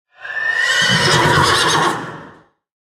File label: Sinrunner Play